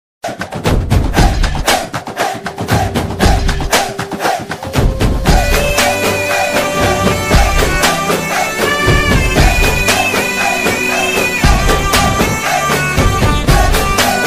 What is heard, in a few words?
raavanan bgm ringtone; raavanan movie